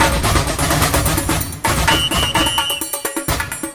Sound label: Fill 128 BPM; Vengeance Samples; VEH1 Loops